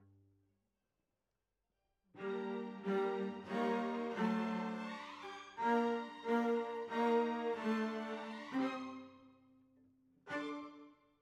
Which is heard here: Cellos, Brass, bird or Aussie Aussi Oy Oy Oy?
Cellos